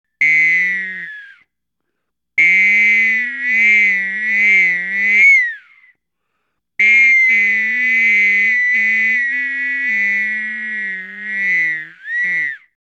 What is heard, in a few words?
solo